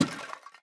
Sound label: junk, player steps; quake2